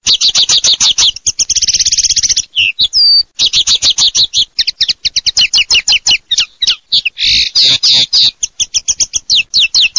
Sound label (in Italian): FANELLO, birdsound